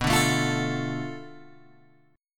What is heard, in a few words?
B Minor 7th Flat 5th B, Guitar Open G